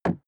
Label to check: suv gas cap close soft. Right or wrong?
Right